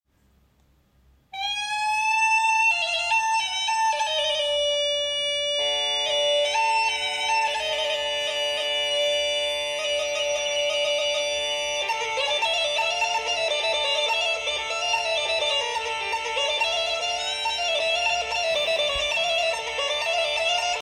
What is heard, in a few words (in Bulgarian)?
ръченица; Мезенска